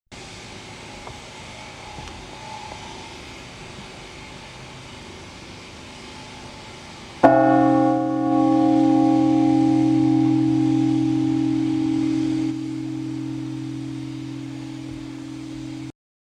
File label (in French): cloche; L'Aigle